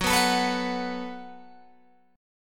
F sharp Minor Double Flat 5th F sharp, Guitar Open G